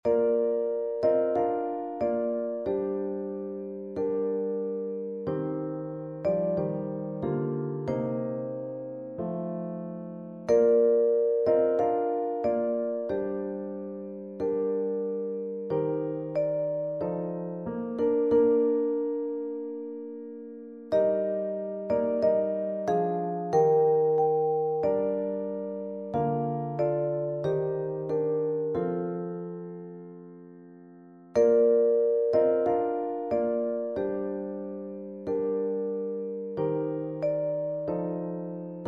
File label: VB; percussion